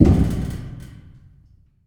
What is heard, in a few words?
metal hit boom rattle; a dump in the dark